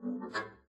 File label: arco noise jumpy high, double bass; dsmolken; noises